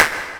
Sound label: Claps; Vengeance Samples